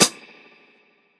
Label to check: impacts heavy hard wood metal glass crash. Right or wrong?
Wrong